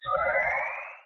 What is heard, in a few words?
balloon take off